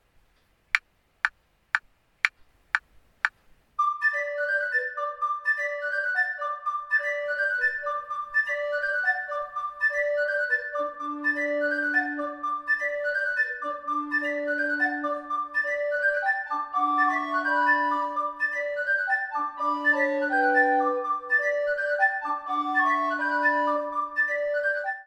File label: Happy End SATB, recorder